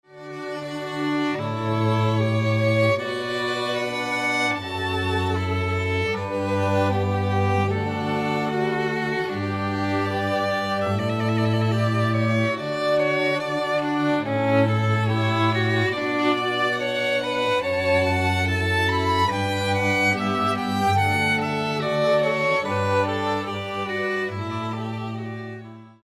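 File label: Strings for wedding ceremony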